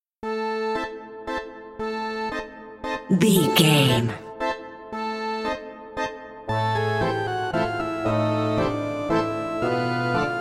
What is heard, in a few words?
haunted carousel